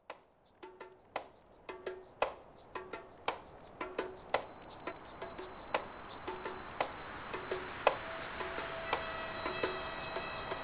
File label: Ambient; Stylus RMX